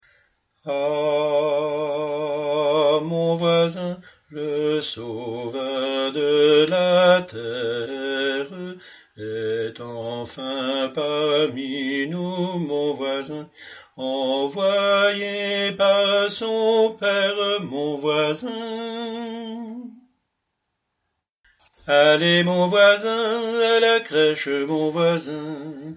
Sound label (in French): basse; allez mon voisin